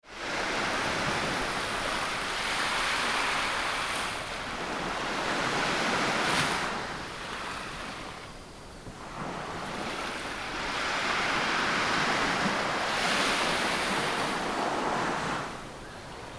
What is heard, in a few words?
wave